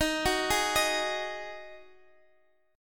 D sharp Minor Double Flat 5th D sharp, down, Guitar, Open D